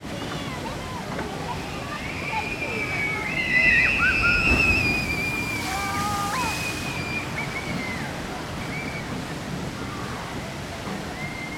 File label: leisure fairground ride log flume dragon river base of drop